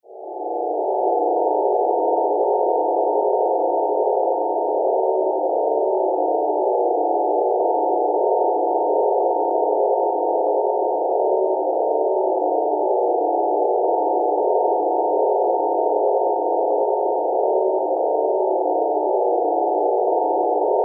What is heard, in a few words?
Sci Fi Radio Drone Vintage Ufo Interior; Communication